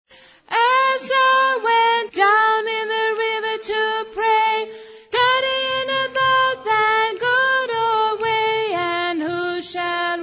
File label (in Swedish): bas